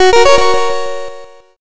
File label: win